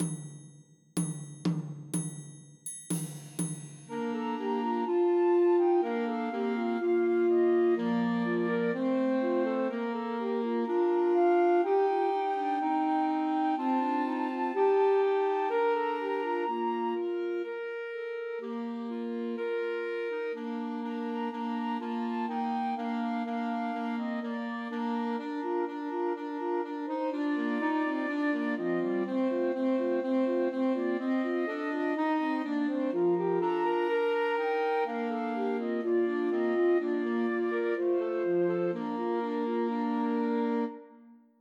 Joy to the World Christmas Trios for Flute Clarinet and Alto Saxophone